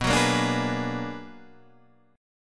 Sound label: B-Minor Major 13th, Guitar Half Step Down